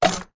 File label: box Close